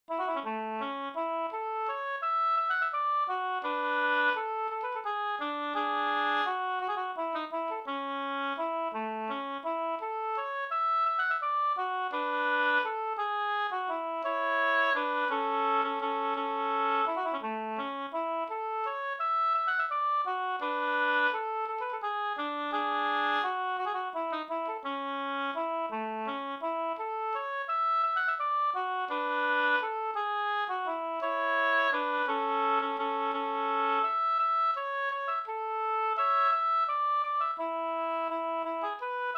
vals A dur; Gas-Anders

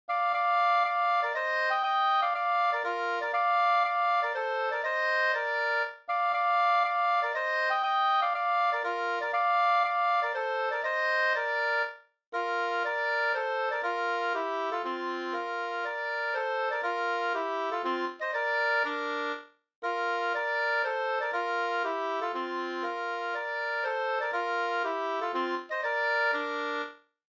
polska

